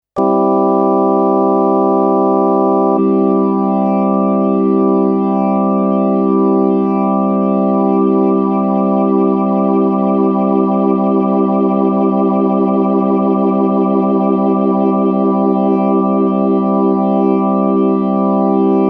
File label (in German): B3 Leslie ohne langsam schnell langsam; M-Solo